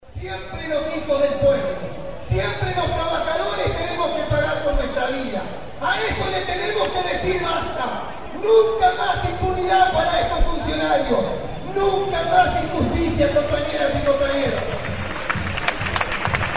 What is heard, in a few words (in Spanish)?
actos repudio; asesinato carlos fuentealba; g teres